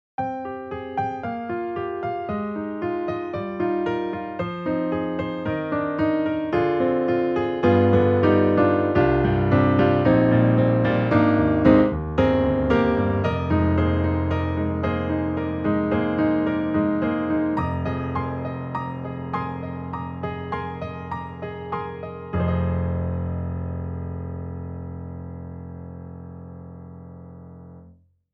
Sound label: Studio Grand, Close Mix; XLN Audio; Addictive Keys